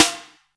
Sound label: Boss DR-660 Snare, Drum Machines